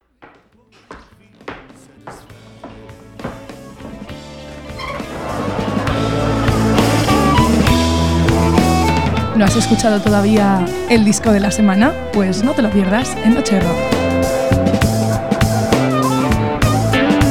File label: BLUES